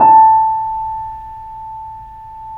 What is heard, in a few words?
The Piano, A; InVision Interactive - The Piano; BOESINGSOFT